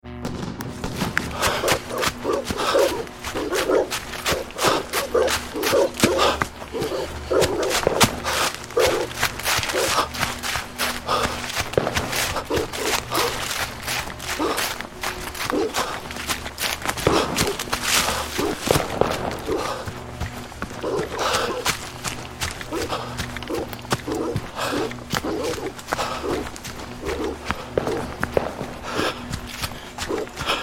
Out of breath man running away through woodland from pursuers with dogs; Out of breath man running away through woodland from pursuers with dogs firing guns with background alarm sounding.; The Fugitive